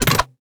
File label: Attack Crossbow; Game Essentials